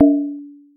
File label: alert